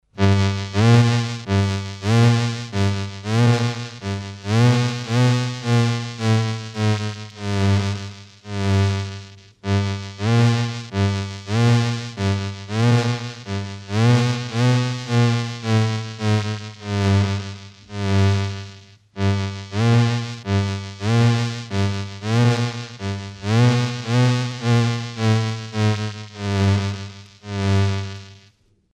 ascend + descend seq iii, Electronic Sound Patterns; His Master's Voice